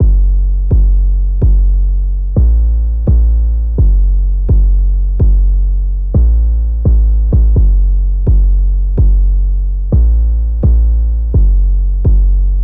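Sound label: 808 Loop, F minor, 127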